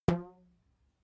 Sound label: rubber band pluck short